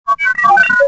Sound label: talk, r5d2